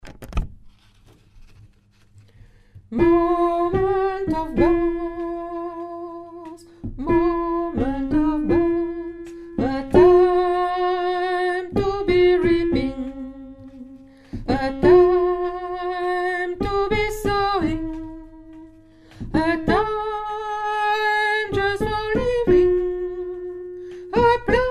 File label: soprano